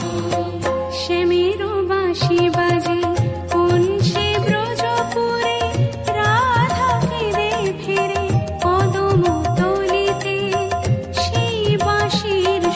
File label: om numh shivay dhun